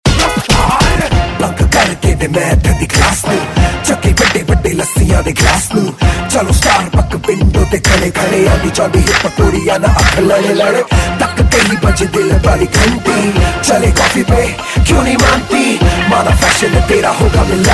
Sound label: Hindi Rap Ringtone; ringtones